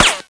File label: metal, srifle; SINDEMO; weapons